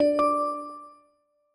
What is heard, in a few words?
Alert Quick; NOVA 2022.1